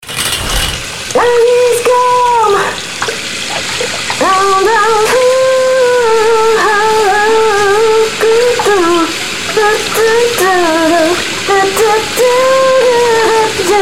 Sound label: singing in the shower